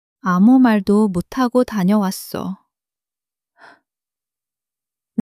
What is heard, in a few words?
crying; monologue